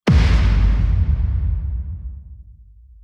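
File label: Strong Boom Transition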